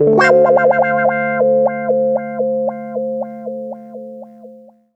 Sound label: 120 WAH PAD, Electric & Acoustic Guitar Loops